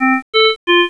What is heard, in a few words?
vent med, alarms